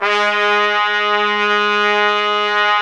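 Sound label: trumpets section; Roland L-CDX-03 Disk 2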